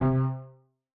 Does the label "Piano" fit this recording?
Yes